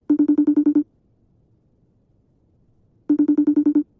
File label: facetime incoming call